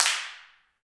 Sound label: PRC HNDCL, Clap; Rhythm Section; Clap & Snap; Clap Modules